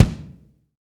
kick, ambient kick; Rhythm Section

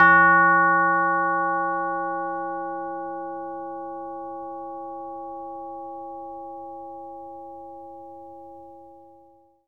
CHIME D#4; ILIO; Synclavier Percussion 2; World & Orchestral

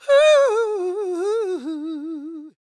SING, Vocal Planet Blues & Soul; CW SOUL

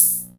Loop, Drum Machines, Kent K-220 Rhythm System; Kent K-220 Rhythm System Ableton Project; Processed

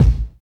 BOOM KICK; East West; Phat & Phunky

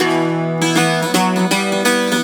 Celtic Flavours; USB Soundscan vol.42; AKAI

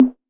TR808 Tom

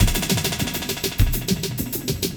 LOOP, Drum & Bass, 100 BPM; Ueberschall; Drum & Bass Resonance

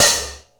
Vintage Half-Open Hi-Hat; Drum n Bass; Hi-Hat One-Shots; KJ Sawka; Drum n Bass & Beyond; Drum Tool Box

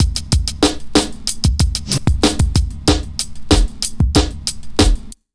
BEAT R, Pure Electro; USB Soundscan vol.03; AKAI

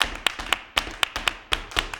120 CLAPS

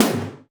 Mega Drums, Korg 01W

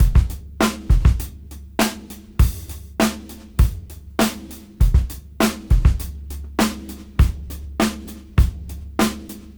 Old School 101 bpm

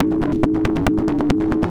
housefunk; Sonic Foundry (Sony Creative Software); Ugly Remnants VOL-2

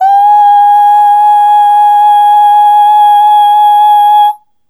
Ooh Long G#4 Dry; Harmonic Building Blocks

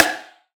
Acoustic Drums - Perc; Heavy Acoustic Drums; Antidote Advent

